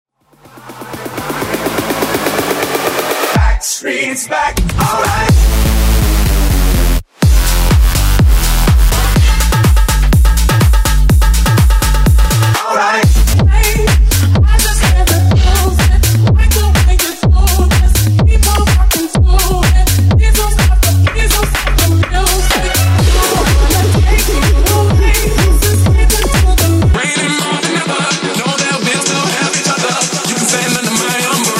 Throwback Pop House Megamix

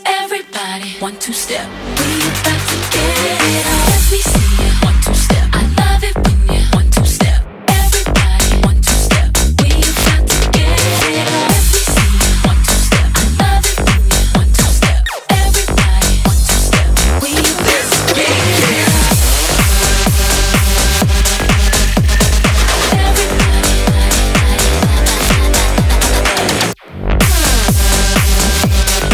Tech House 126bpm Gm

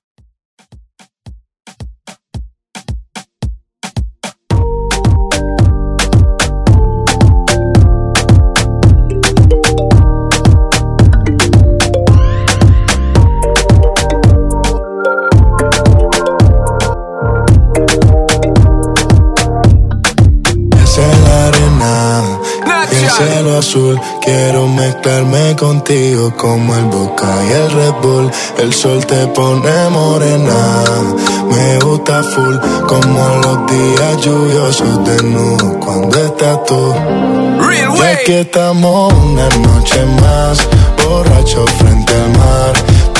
Moombah Redrum

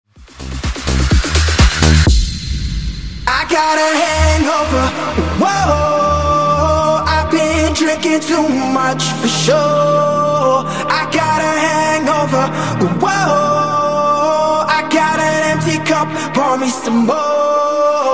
Funk Bootleg Clean 126 Bpm

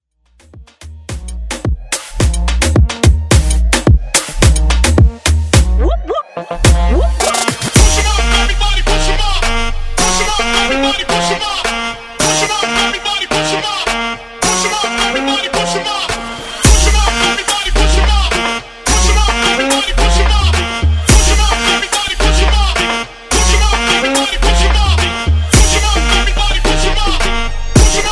Moombah Hype

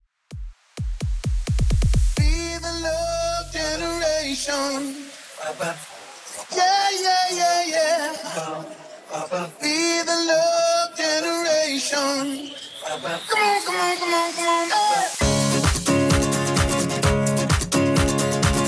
Epic, 129 BPM

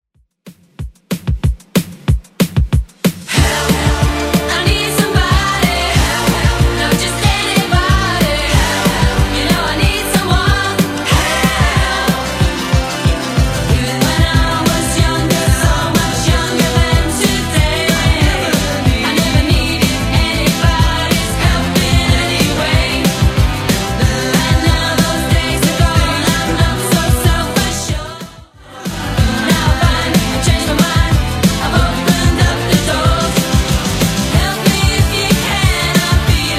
80s Redrum 186bpm; remixplanet

